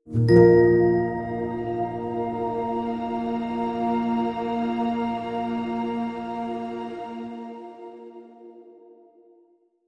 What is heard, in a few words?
Frosted Glass, Sting